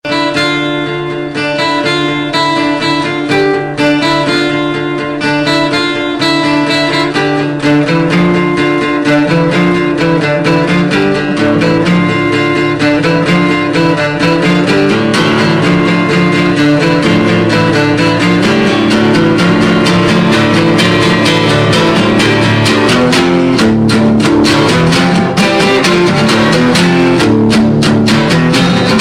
saw guitar

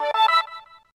ring; saints row 2

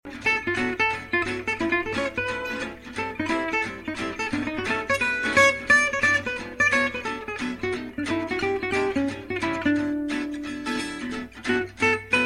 jazz

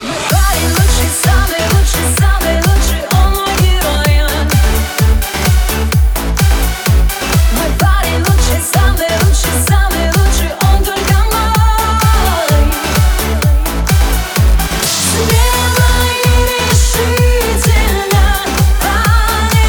remix

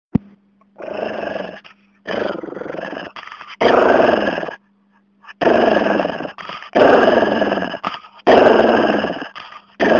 dog growl

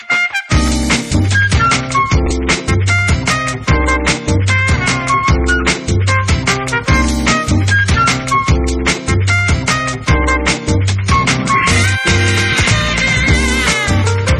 soul funky

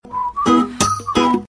whistle

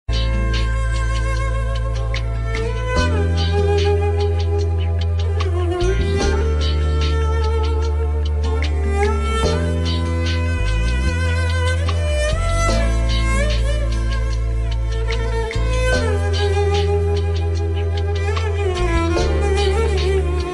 instr

